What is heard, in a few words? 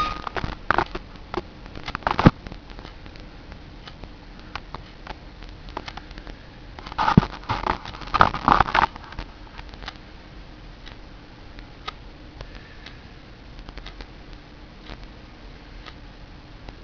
ticking; woodnclk